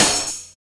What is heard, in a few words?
Programmed Stepz Cut, Drum Loops, Jungle, 166.5